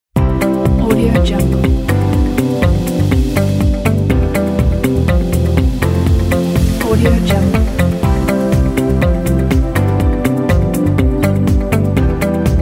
motivation corporate ident pack

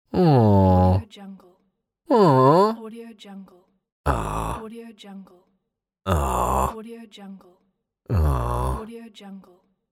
aww

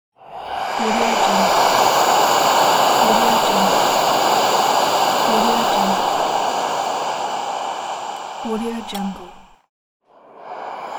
iron steam

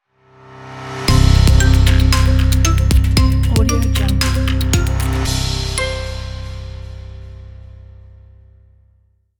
calm tech logo

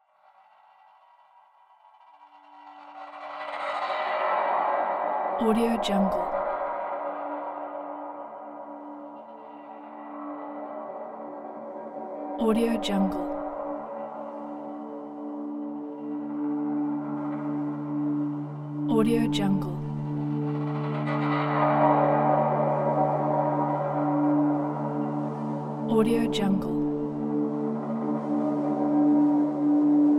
strange ambience

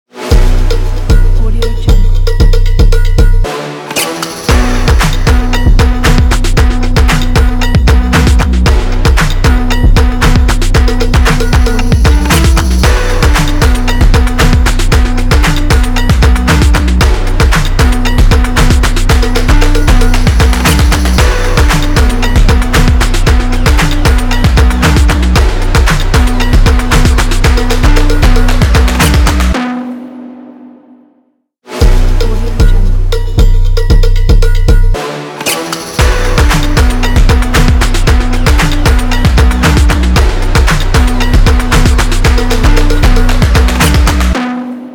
stylish fashion hip hop; onymusic

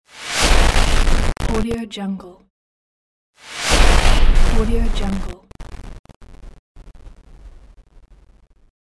glitch logo short; phillipmariani

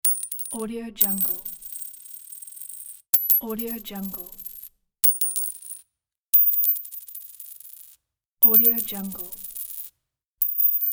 coins

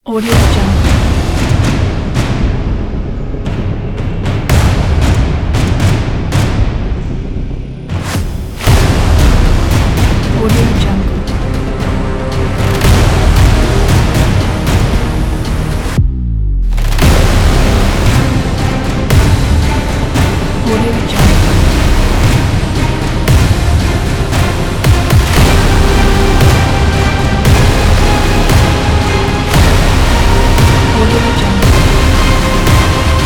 epic action trailer

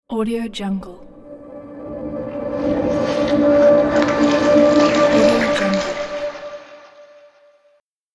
water spirit elemental ghost

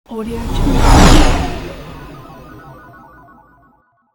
space ship

